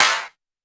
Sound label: Clap